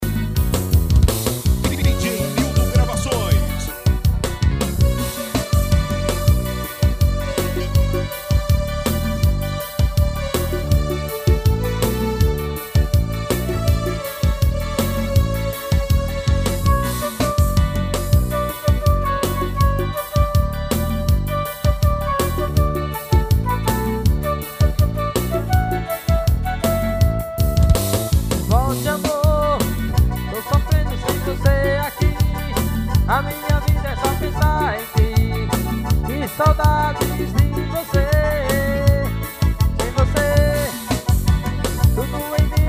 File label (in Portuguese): forro